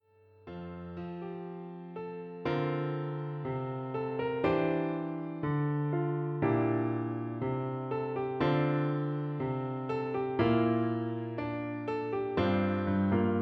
BALLAD FOR PIANO VIOLIN duet

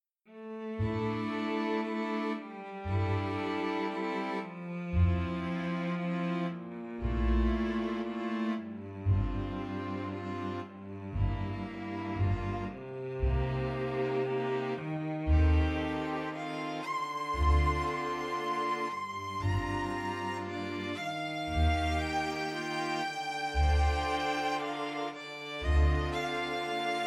String Orchestra or String Quintet

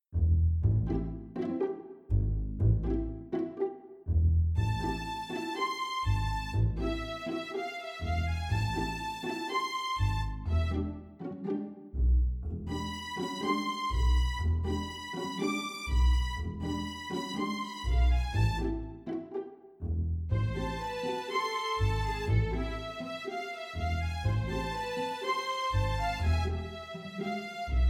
String Orchestra Quintet

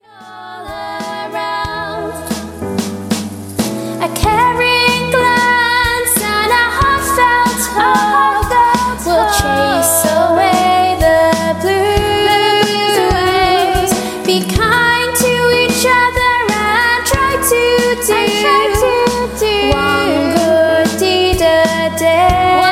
2 part song

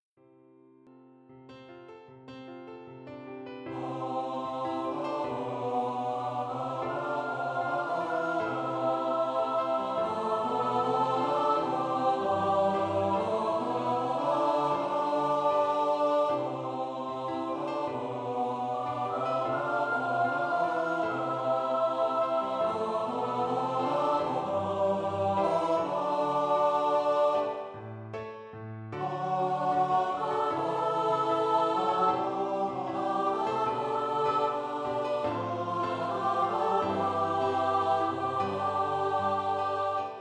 2 part song for SATB choir

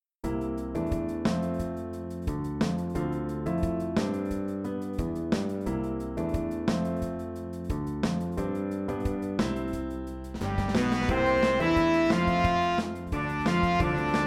for jazz ensemble

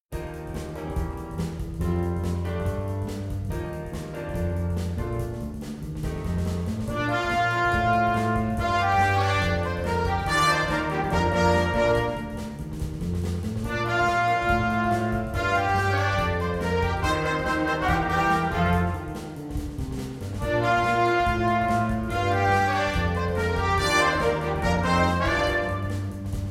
jazz ensemble

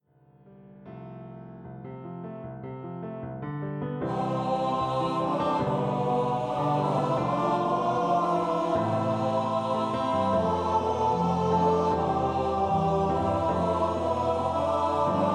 2 part song for Male Voice Choir

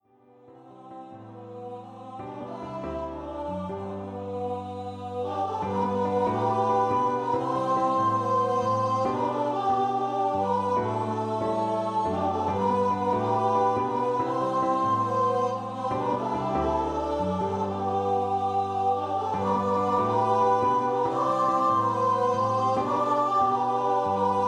3 part song for treble voices

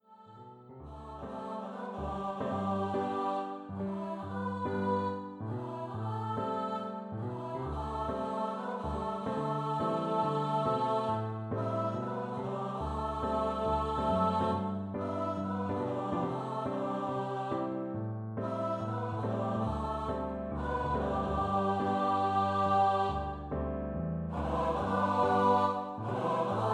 2 part song for SATB Choir

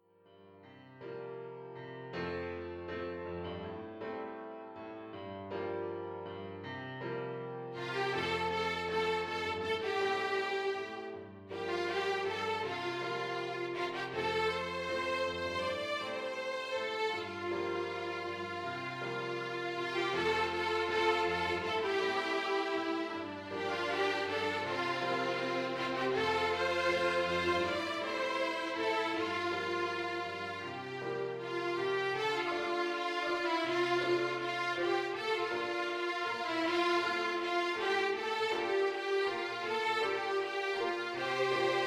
violin duet with piano accomp

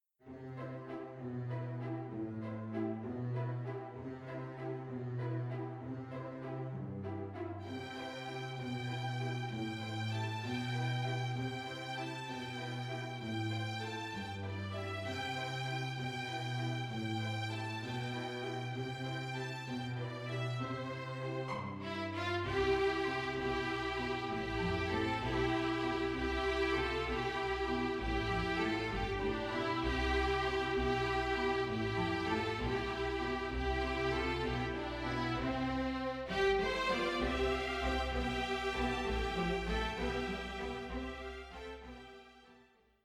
String Orchestra

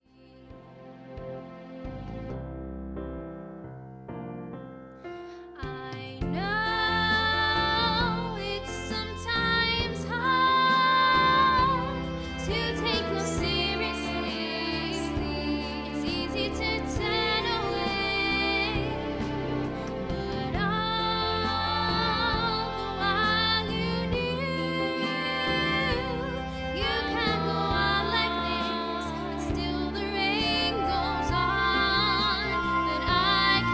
live version of 2 part song for Ladies Choir

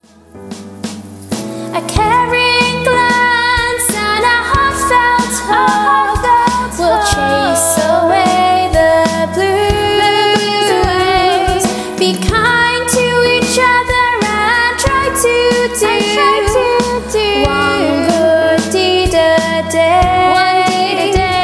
Live 2 part song for treble voices

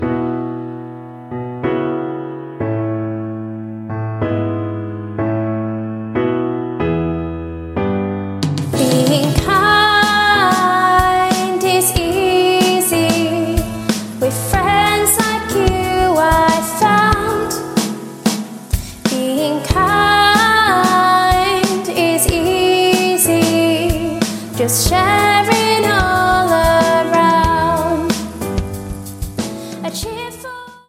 BEING KIND IS EASY Unison song

